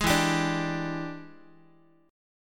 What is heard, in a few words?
E 7th Sharp 9th E down Guitar 4A 4E 5A 5D 5G sharp 6C sharp; strummed; chord